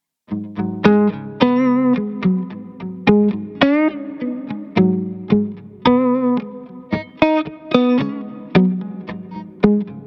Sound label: 57 Neck